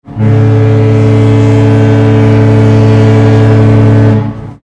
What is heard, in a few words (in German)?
typhon, kreuzfahrtschiff; mein schiff 2; klingelton